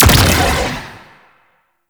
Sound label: Primary Shot, Weapons; Warframe; Cephalon